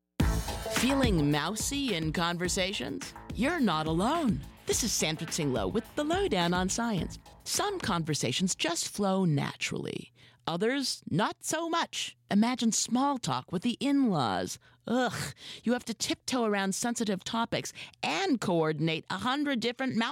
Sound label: Singing; Mouseketeers